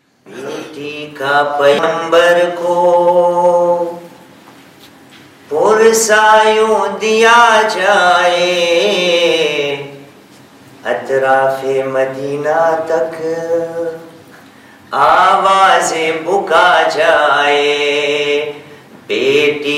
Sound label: marsya; sayada fatema sa